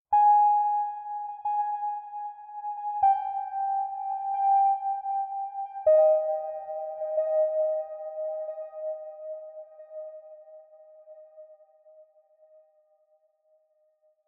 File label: Pings; Martian Chess Audio